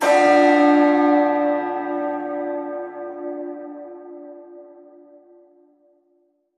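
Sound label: Hit Ambient Bells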